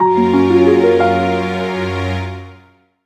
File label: (Jingle) Fanfare